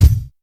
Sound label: steel kick drum g sharp key